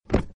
land; Power Rangers vs Teenage Mutant Ninja Turtles - Ultimate Hero Clash 2

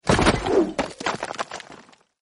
fall Hole; Legends of the Hidden Temple - Unlock the Past

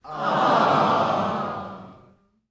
fail; Nick Summer Sports Stars

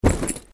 grab; Keep Out! A Dungeon Adventure for the Brave

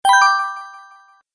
btn collect; Legends of the Hidden Temple - Unlock the Past

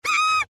yelp; Danger Mouse - Ultimate

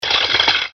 Gate Close; Adventure Time - Gate Crashers

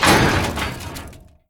Smash, Truck; Nickelodeon - Destruction Truck Derby

